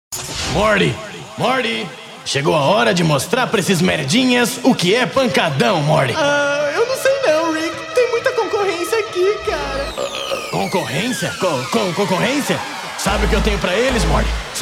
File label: funk mega bass